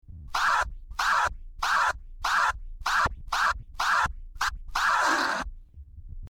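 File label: forward, scratch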